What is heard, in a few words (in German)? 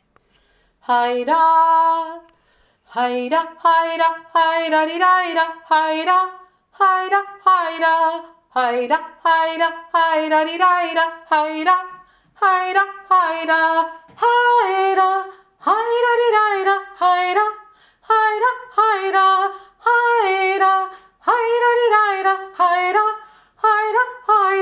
haida eine stimme